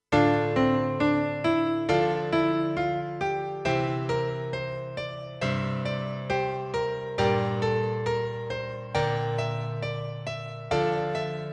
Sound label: noise